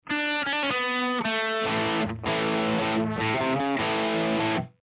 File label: 1968 Plexi Jump Lead